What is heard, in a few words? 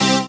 dance chord